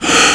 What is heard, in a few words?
gasp; quake2; coop